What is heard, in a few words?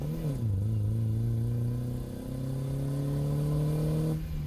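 engine high loop; Blind Drive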